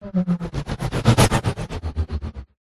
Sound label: whoosh; Audio Mangler